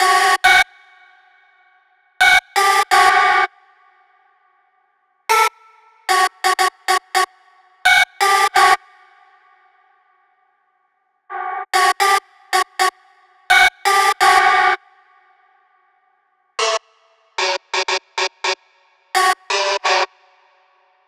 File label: Synths, Ghost, 170, Bm